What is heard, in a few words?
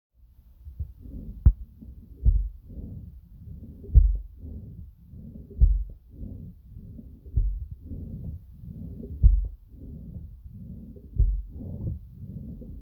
Heart Beating